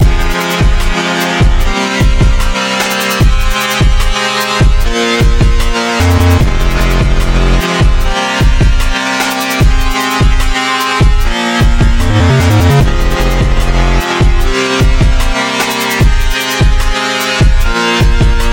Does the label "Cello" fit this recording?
Yes